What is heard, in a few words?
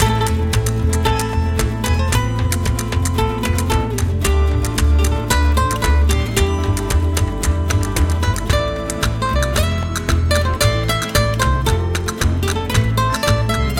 Speed Up; Tapas del Mar; Cooking Dash